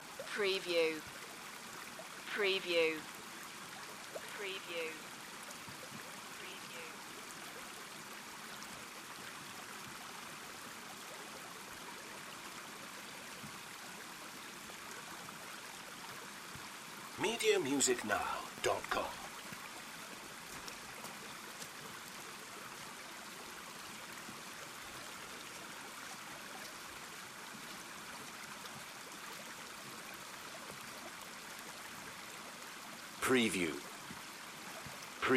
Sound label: River Stream Rushing Water Loop Long; lynne-publishing